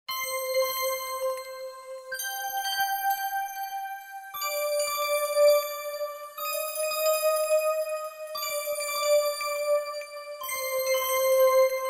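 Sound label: mistich muz hrustalya, mystical music of crystal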